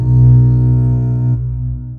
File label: So low bass B